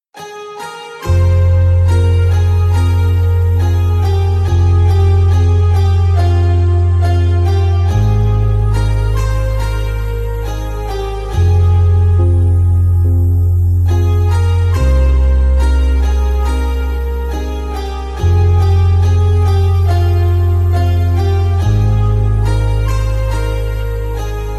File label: Solo BGM